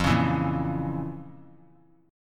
F Major 7th Suspended 4th Sharp 5th F; FM7sus4#5 strummed; JGuitar

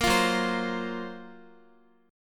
F sharp Major Sharp 11th, Guitar; F#M#11 strummed; 4F,4A,5C,5G,5C,6E; chord shape

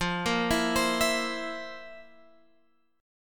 F Major 7th Suspended 4th, Guitar, Open G

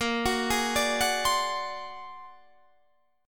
A sharp Augmented 9th

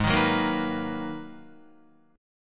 Ab Minor 9th Ab, Guitar; Listen to Abm9 strummed; JGuitar

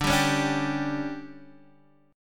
D-Major 7th Suspended 4th, D, Guitar, Standard; strummed

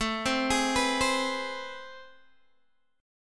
A Minor Major 9th, down, Guitar, Open D

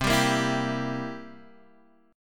G Minor 6th C; strummed; JGuitar

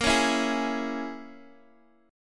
A sharp Minor 7th Flat 5th, Guitar, Open G

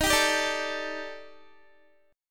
D sharp Major 7th Flat 5th D sharp Guitar, chord; strummed; 4F,4A,5C,5G,5C,6E; 22,22,19,19,21,x; JGuitar

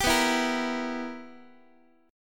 B Major 7th Suspended 4th Sharp 5th, Guitar chord; strummed; x,x,10,9,8,9; 4A,4E,5A,5D,5G#,6C#; JGuitar

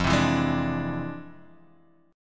F Minor Major 13th, chord; FmM13 strummed; x,8,10,9,8,9,x,x

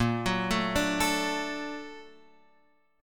Bb Augmented Major 7th Bb, Guitar Open F; Bb+M7 arpeggiated; JGuitar

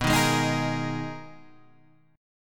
Eb Suspended 4th B; Ebsus4/B strummed; JGuitar

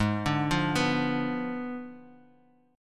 Ab Diminished 7th Ab Guitar 4F,4A,5C,5G,5C,6E; arpeggiated; 3,5,5,4,x,x

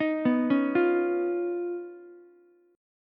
Eb 7th Suspended 2nd Sharp 5th Eb, Ukulele; Eb7sus2#5 arpeggiated; JGuitar

